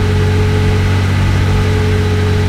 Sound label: centurion engine rpm 66 load, tanks; War Thunder